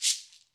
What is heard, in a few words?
Ahfuche; Club-50 - Foundations Roland; Ahfuches